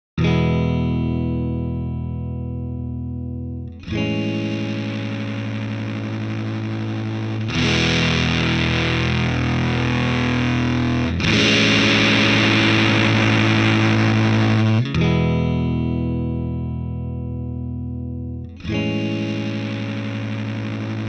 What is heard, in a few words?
Fuzz; verzerrung